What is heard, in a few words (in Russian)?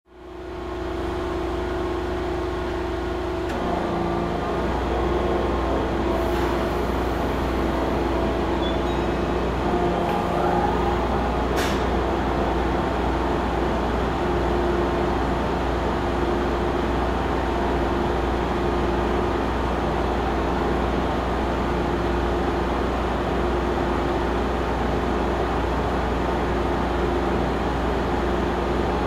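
metro poezd stoit na stancii, metro train standing at a station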